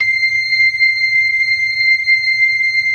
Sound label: LO MANUAL VIB, Organ